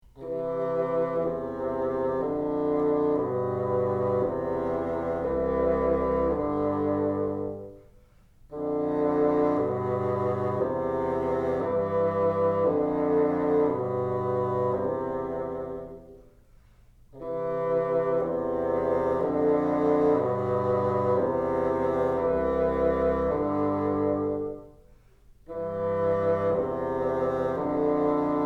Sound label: together, bassoon; The University of Texas at Austin - Sarah & Ernest Butler School of Music